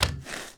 drag step; DoomRTX

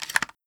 Shotgun Load Shell; Foley; DoomRTX

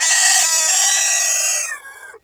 retch pain; Quake 4 Alpha; id software; strogg

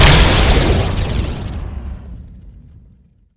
goob explo, weapons goobgun; Quake 4 Alpha; id software

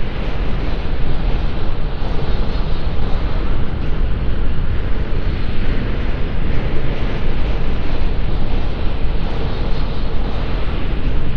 cryo huge machine amb; Quake 4 Alpha; ambience cryo

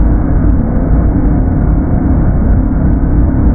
dropship engine; Quake 4 Alpha; id software; vehicles

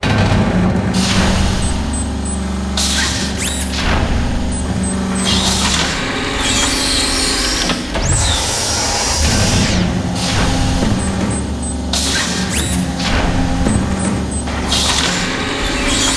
sequence, fuel mixer; Quake 4 Alpha; id software

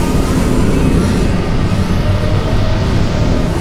grav grabbed, weapons gravity; Quake 4 Alpha

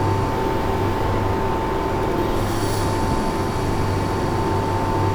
computer station, ambience; Quake 4 Alpha

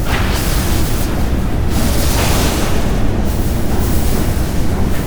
industrial amb lite; Quake 4 Alpha; id software

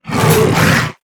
imp attack melee, monsters; DoomRTX; imp5

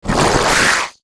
imp attack melee, monsters; DoomRTX; imp5

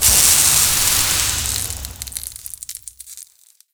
cryo freeze; Quake 4 Alpha; id software

